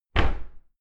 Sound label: cupboard slam close